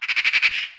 magpie; World of Tanks Blitz; ambient medvedkovo

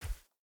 wolf footstep sand hard; Rust

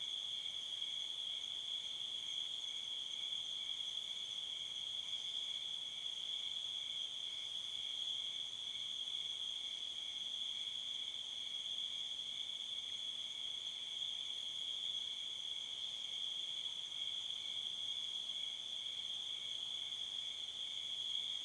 Ambient Field Night; DCS

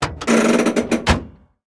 transmission lever, tanks

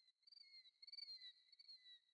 sting songbirds; Rust

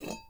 pickup metal hollow; Rust

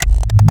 adrenaline startup

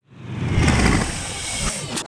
furious off; World of Tanks Blitz; GUI battle streamed

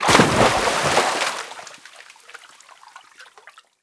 foot wet slide end; Call of Duty Modern Warfare

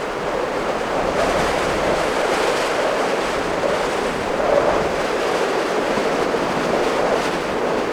Amb Weather; Red Orchestra Ostfront 41-45

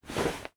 Inf Weapons Foley; Red Orchestra Ostfront 41-45

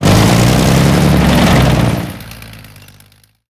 pt76 engine load; War Thunder

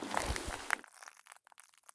prone glass; Escape from Tarkov

